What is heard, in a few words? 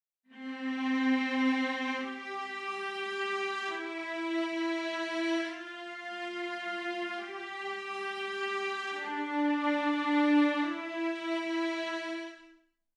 Violas